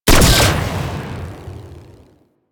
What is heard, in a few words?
Railgun Fire